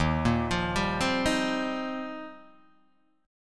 Eb 6th Add 9th, Guitar, Open D

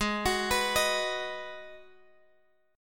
Ab Minor 7th down Guitar Drop D

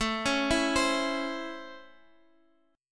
Db Minor Major 7th Sharp 5th A

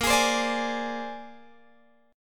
Bb 9th Bb down Guitar Open G

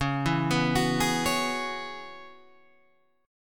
C sharp Minor 11th C sharp, Guitar Open G

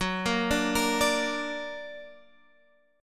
Gb Augmented, Guitar, Standard; arpeggiated

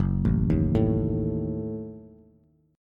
Gb-Minor 6th, Bass; arpeggiated; JGuitar

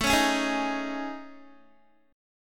A Major 7th Flat 5th, Guitar, chord; AM7b5 strummed; JGuitar

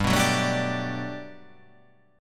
G Major 9th, G, down

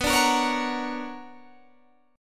B Minor Major 9th B Guitar Standard, chord; BmM9 strummed; JGuitar

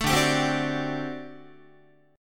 D 11th D, down, Guitar; D11 strummed; tuning 4A,4E,5A,5D,5G#,6C#

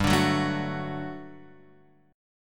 G Major 7th Suspended 2nd G; GM7sus2 Chord {3 5 4 2 X 2} strummed

